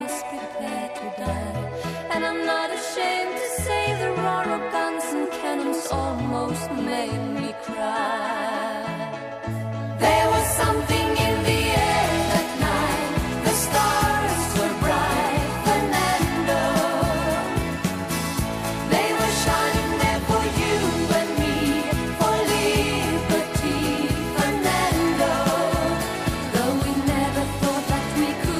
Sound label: argentina song